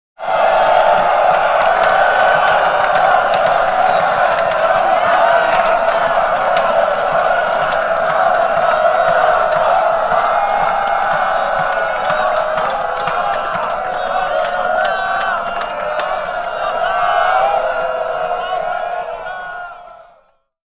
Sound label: Infantry Roars 1000 Men Roar; Historical; Hollywood Edge Historical Series; War Horses Armies & Weapons